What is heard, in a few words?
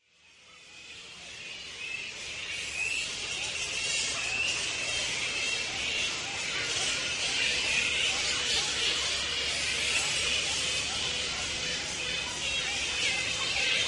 Bellingen Morning Soundscape